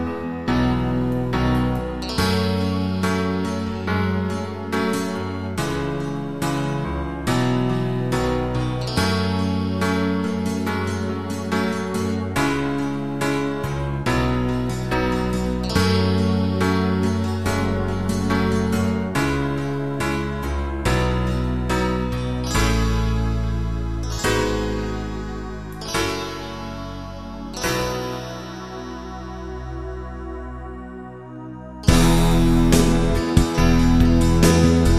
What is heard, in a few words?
live piano string